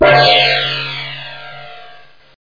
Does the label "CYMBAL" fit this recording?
Yes